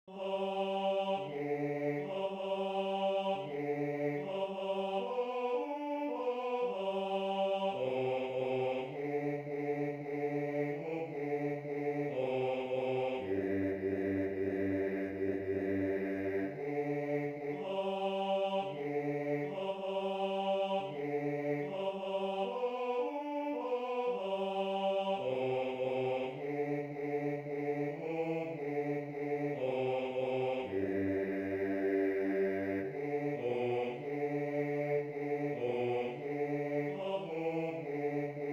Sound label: Bass II; I schwing hin